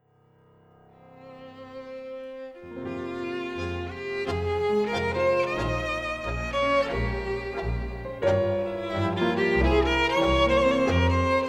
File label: TANGO, Tango Symphony